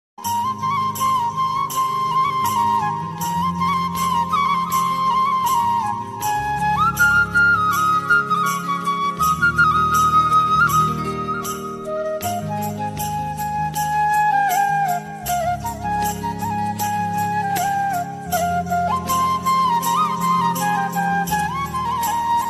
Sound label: ringtone romantic ringtone marathi ringtone ringtone